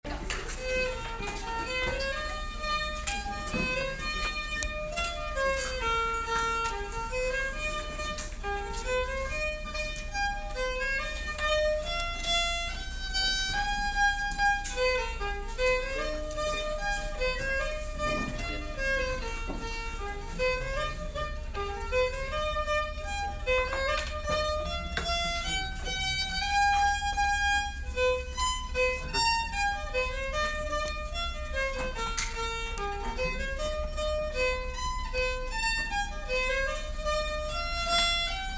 polka

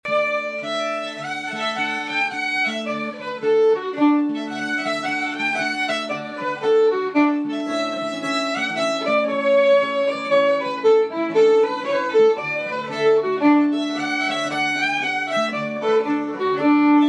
Waltz

